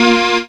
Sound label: syn a#, Dance Synths Stabs & Hooks; Best Service ProSamples vol.37